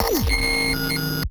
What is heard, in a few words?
Malfunctioning Robot, Electronic; SFX